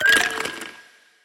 respin block break slow; medallion; Fantasma

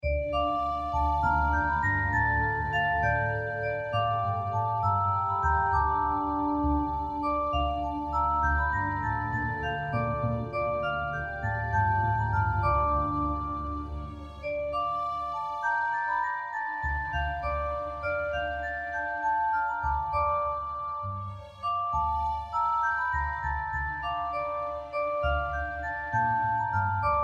Background; Mirror Magic; genesis

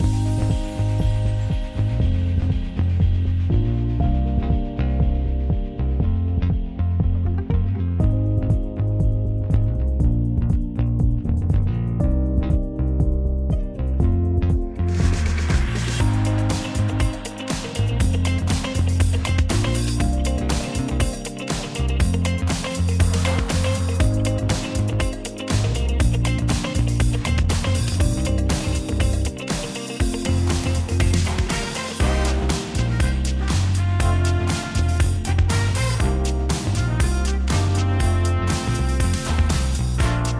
Background; Lock A Luck

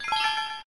match; Recode; jewel Quest Riches

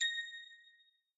scaling tiles; Skillzgaming; fruit Blast Desktop

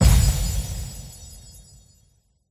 reels remove; hades River Of Souls; Fantasma

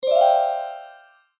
xp new level; Skillzgaming; mega Money Rush Desktop

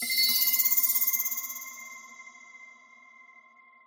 symbol highlight; Recode; rampage riches Desktop

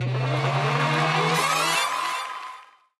respin start; riviera Star; Fantasma

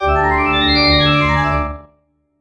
Win; Mirror Magic

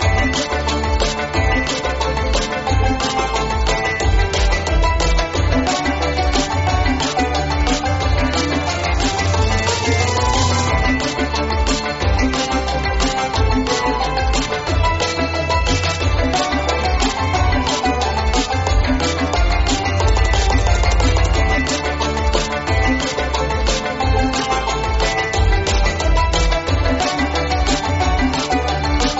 music feature; medallion; Fantasma

